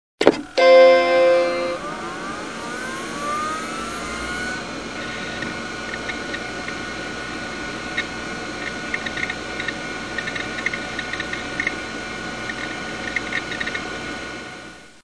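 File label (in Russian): включение и выключение компьютера компьютера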